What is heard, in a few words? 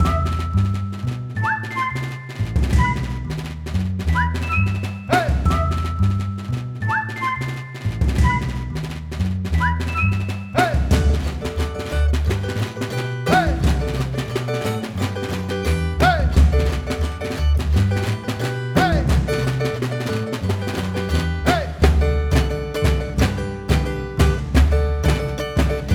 Infernal Pursuit (Perc & Bass); Toad Story